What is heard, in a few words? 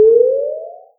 Alien Space Sound